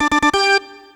Alert; business scheme beta 2

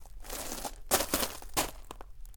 rocks scuff; Golden Crayon Sounds

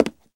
hardwood step; Golden Crayon Sounds

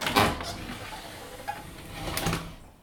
door open; Golden Crayon Sounds

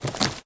branch step; Golden Crayon Sounds

